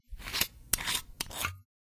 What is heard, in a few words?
Screwdriver